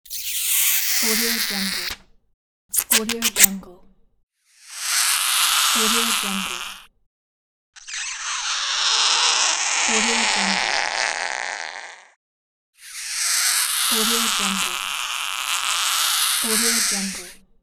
screeching elements

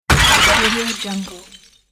glass break hit

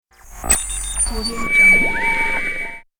radio

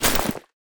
organic inventory discard; war ui; esmteam

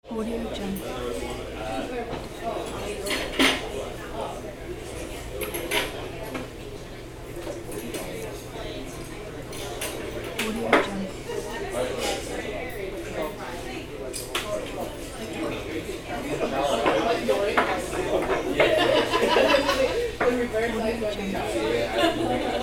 moderate restaurant ambience; maleo113

